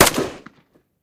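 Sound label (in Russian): единственный прицельный выстрел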